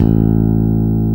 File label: BS 5 STR PB, P.Bass; Roland L-CDX-01